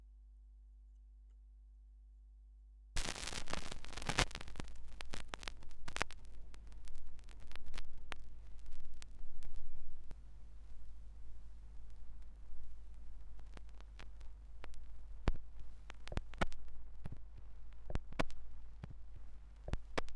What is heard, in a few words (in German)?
schallplattengeraeusche; rauschpartikel